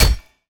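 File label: pickaxe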